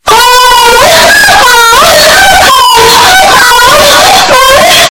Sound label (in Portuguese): gemido zap